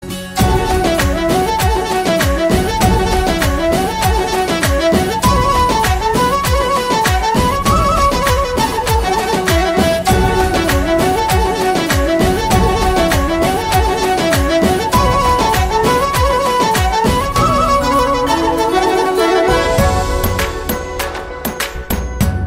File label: Instrumental